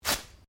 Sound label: Clothing Velcro Rip Short